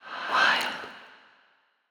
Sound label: wild Sym Landed; goldengrimoire